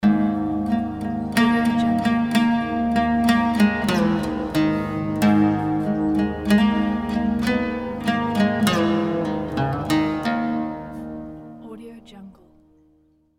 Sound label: sahara desert logo